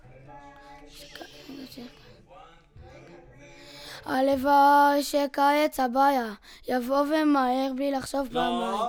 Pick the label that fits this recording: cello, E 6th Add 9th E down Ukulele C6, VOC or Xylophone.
VOC